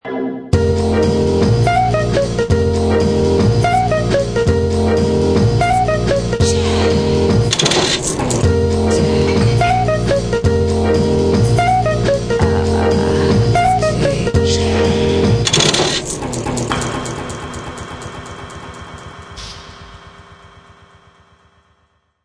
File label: jazzalude